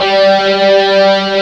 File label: GUIT G3; AKAI S-Series CD-ROM Sound Library VOL-2